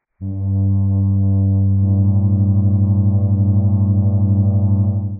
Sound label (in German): flöte g a; Intervalle, die man durch Potenzen von 2 und 3 darstellen kann